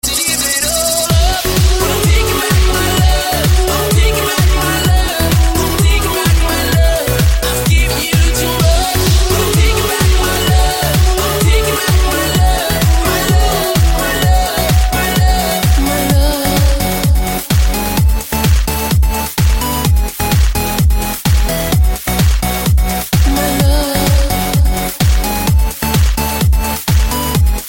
cool guitar, electronic ringtones

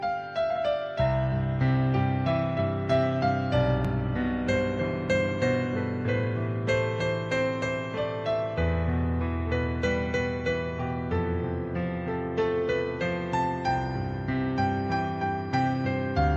Piano

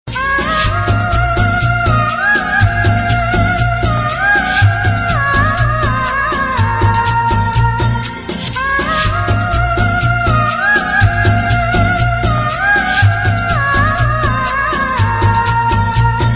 nice humming, ringtone

